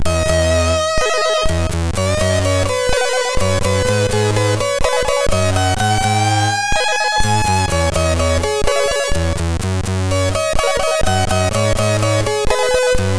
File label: sid MOS6581R3; Willi 03